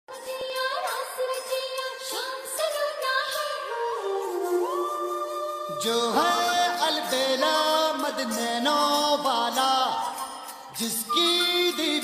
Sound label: slowed reverb ringtone; instagram trending ringtones